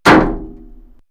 Hard'n' Hollow, perc fx; X-Static Goldmine

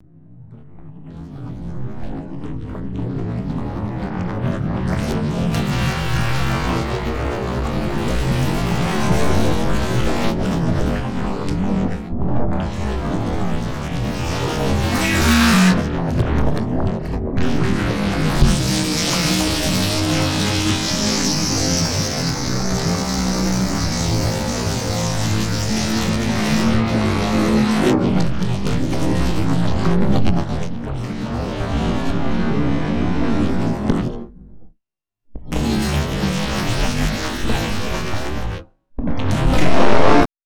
boggy bass

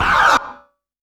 F4, synth line; 90 s Sample CDs; NiceBeats; SynthLine VOL-3

